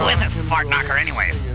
fart knocker; "Who is this fart-knocker anyway?"

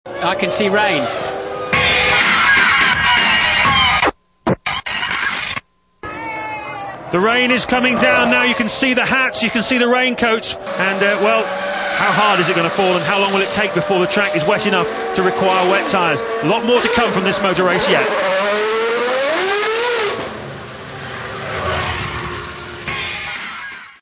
rain; brazil 2001

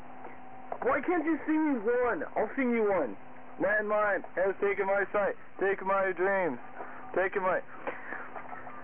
sing

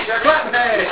slaphead; SLAP HEAD Game

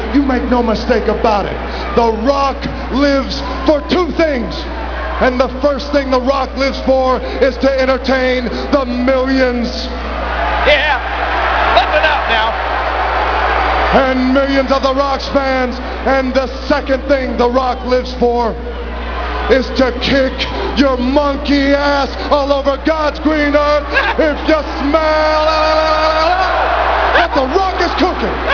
rock

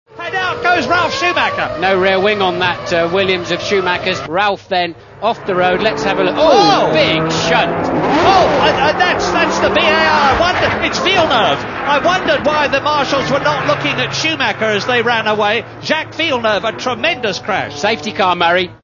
crash; australia 2001; A "tremendous crash" between Ralf and Jacques means an awful start to the season, as a marshall is killed by flying debris.

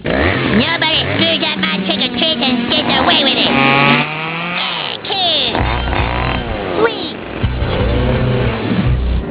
chainsaws; Nobody screws up my 'Trick or Treats' and gets away with it.

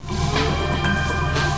Default Beep

